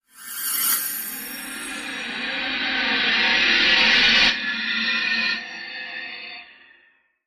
Nova Wipe

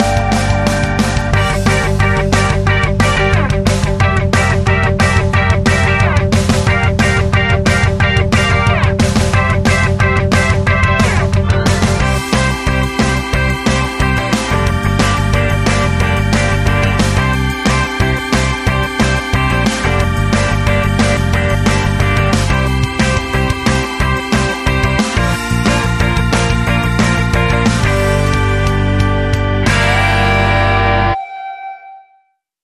Electric Guitar Frenzy, Underscore

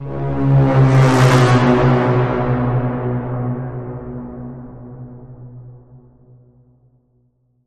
Bend, C sharp

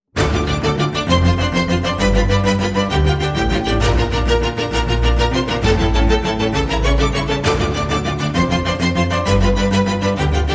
Tudor Tick Tock, Chamber

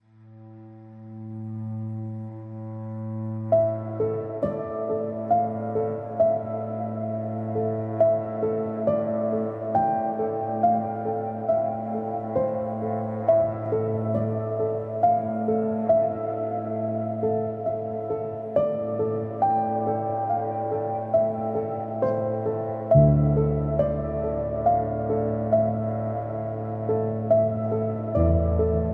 Piano and Background Atmosphere

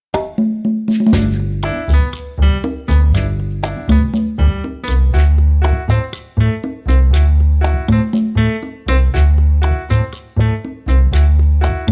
Havana Big Band, No Horns

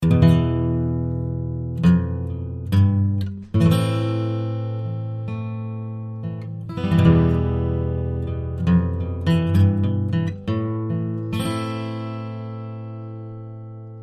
Italian Cowboy

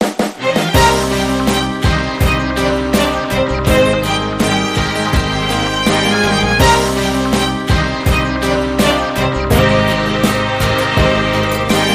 Big String Beat

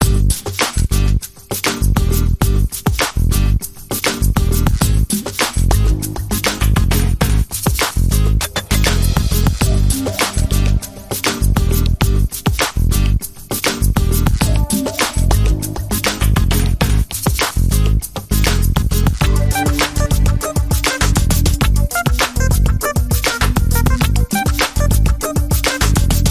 Style R&B

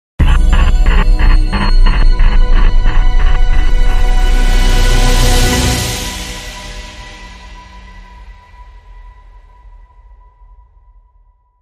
Dramatic Sting, Short

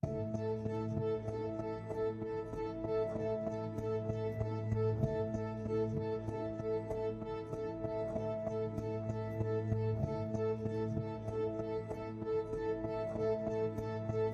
Dreamy Bells, No Bells

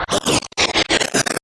Interrupted Static

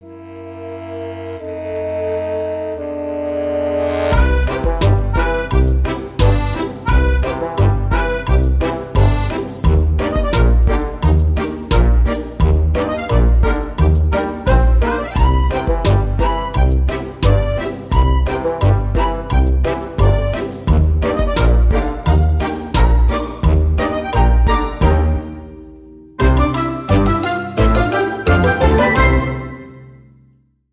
Electric Drums

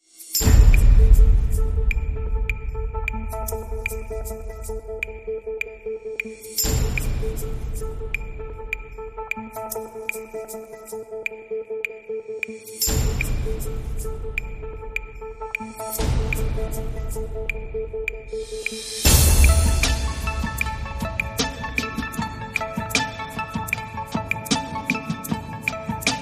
Percussion and Synths

